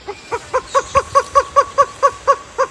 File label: Greater Roadrunner